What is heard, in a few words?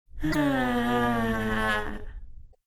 sad, spirit; slender; dbd